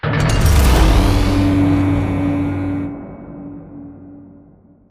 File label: Giant Floor Panel Assemble Start Mech; Boomtown; Sideways Town; Titanfall 2